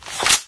m16 draw; weapons; svencoop

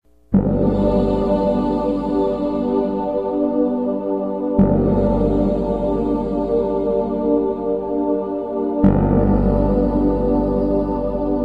music once; svencoop

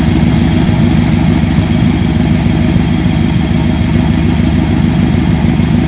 car idle; ambience; svencoop; azuresheep

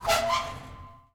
vent open; svencoop; twhltower

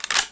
sniper bolt forward; svencoop; weapons

